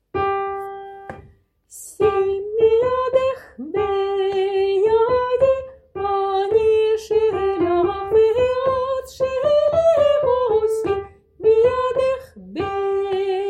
Simi yadech Soprano

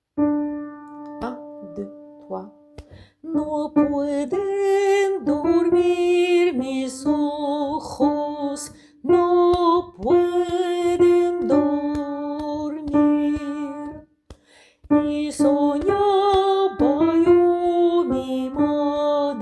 No pueden dormir Alto

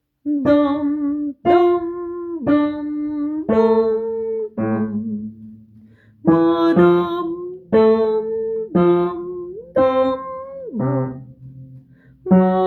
Sarabande Basse Var

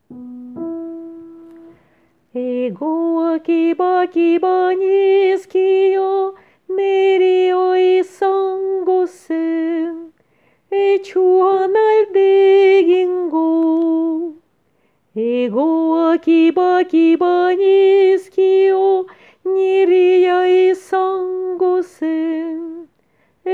Mezzo

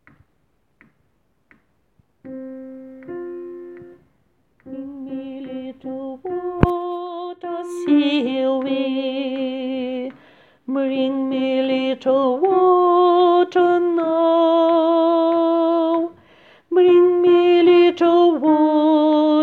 Alto

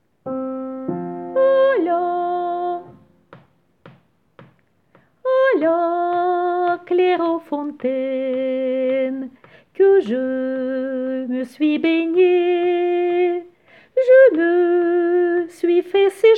A la claire fontaine hommes

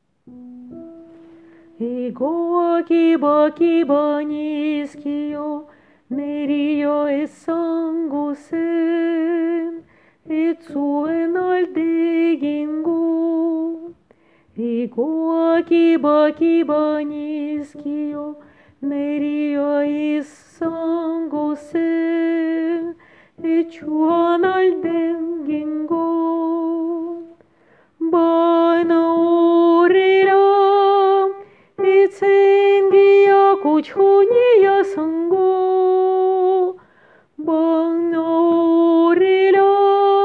Alto

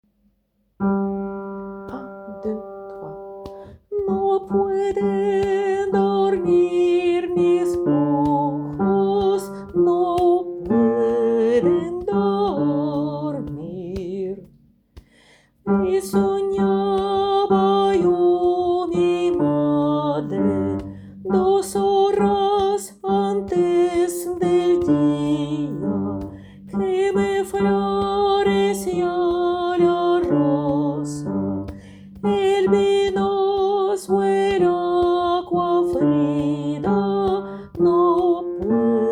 No pueden dormir Basse

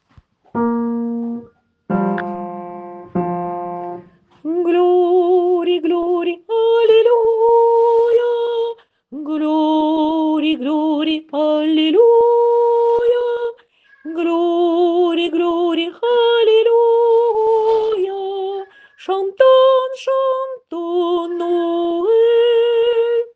Glory alleluia hommes refrain

